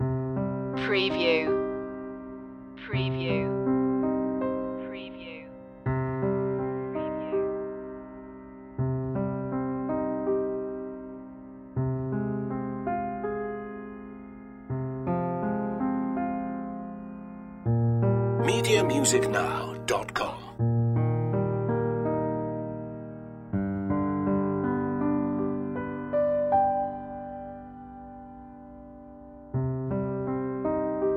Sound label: nostalgica simple chords